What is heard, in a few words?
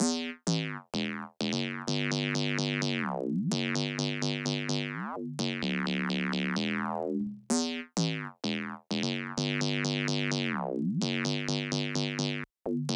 128 BPM E Min Bass; House; Drop Loops; Loop Stems; Cymatics; Volt